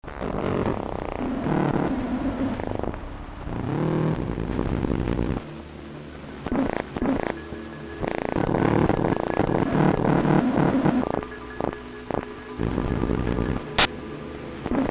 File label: marimba; Solo performances